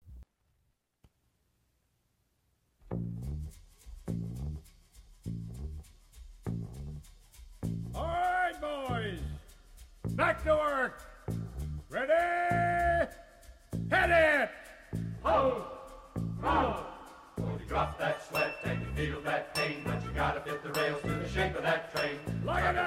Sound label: Male Voc Group